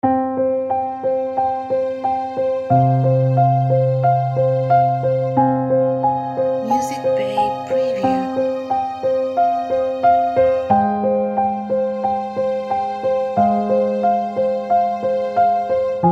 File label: Mysterious Suspense Thriller Music